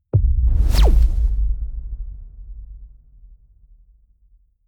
sci-fi plasma gun fire delay

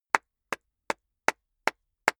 clapping slowly; comedy-cartoon; koerpergeraeusche

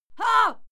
female fight scream hah; schreie; menschen

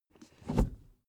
suv pull back gear straight soft slow; verkehr transport; autos

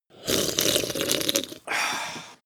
sip beer; koerpergeraeusche; menschen; soundpool

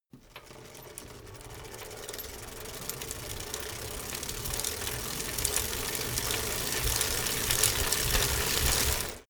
bicycle rickety pedal accelerate

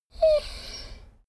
dogo argentino whine snort; natur-tiere; hunde

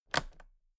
toolbox latches close regular; industrie wirtschaft; handwerk

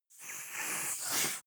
reptile hissing

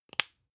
spice jar small close

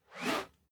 whoosh whistle soft; swooshes-swishes

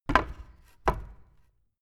fall wood; objekte; holz

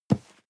cardboard box medium grab slide hard; objekte

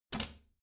bathroom cabinet doors close soft; objekte; tueren

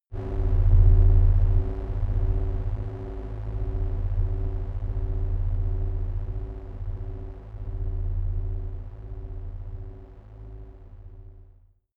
dark engine, drones

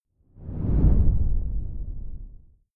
whoosh simple low grainy

